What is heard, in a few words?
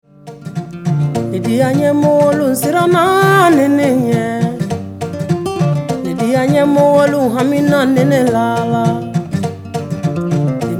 Acoustic